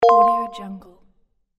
notice

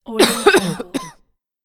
woman coughing

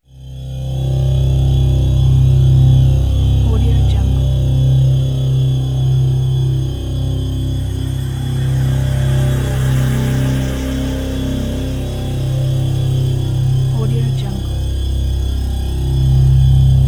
horror atmosphere

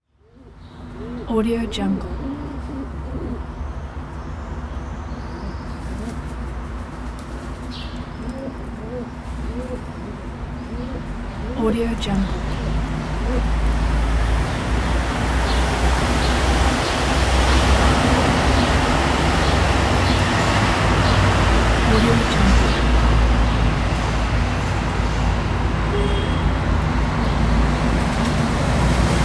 city ambience with traffic; chicasound

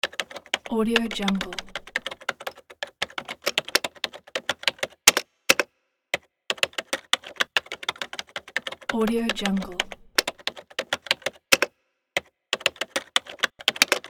keyboard typing

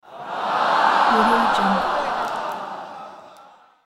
large crowd reaction "ahh"

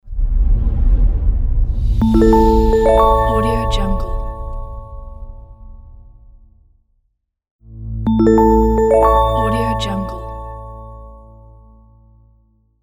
short logo reveal; matsteiner fx

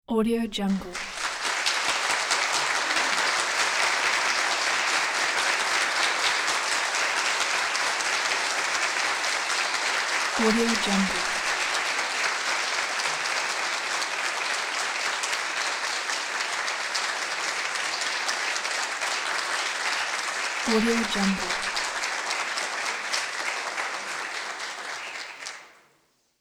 applause

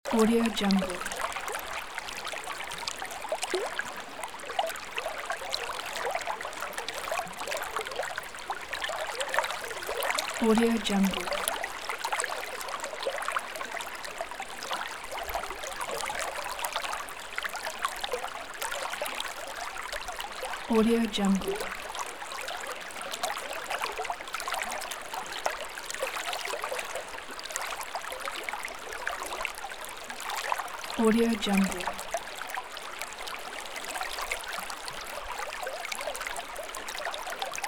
calm river flow; sviridpavlov

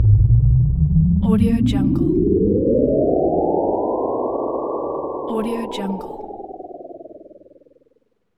spaceship slow departure

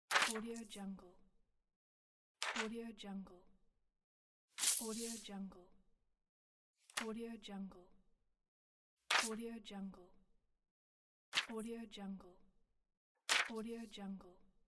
paper sheet falling

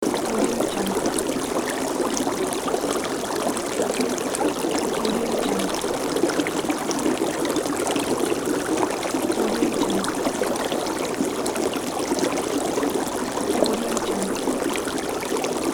boiling water loop

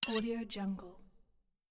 knife scrape

